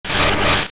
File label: Sword Spin; Sword-spin attack (swoosh); Zelda